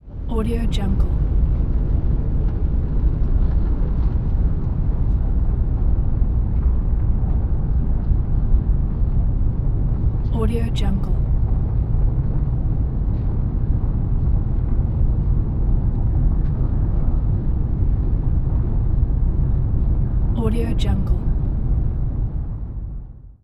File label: underground; albasfx